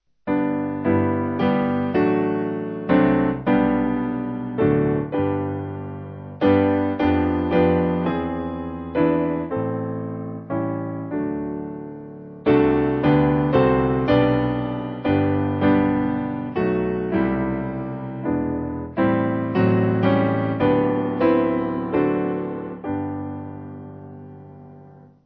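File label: Piano, hymn backing; Simple Piano; Just As I Am; Dunstan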